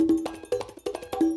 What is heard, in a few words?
175 D&B Fast Conga; Dance DJ; Percussion; Conga Bongo